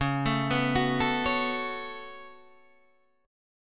C sharp 7th Suspended 4th Sharp 5th, Guitar Half Step Down; C#7sus4#5